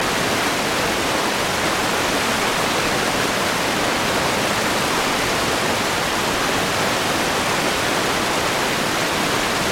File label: Water Rapids Flow River Loop